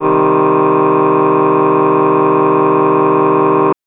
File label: Synth VoiceOh min c2; TrashLibrary